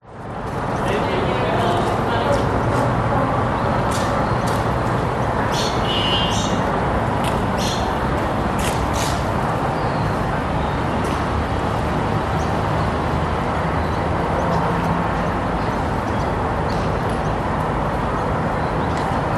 public places park botanic gardens cafe outside distant city few people birds; midday sydney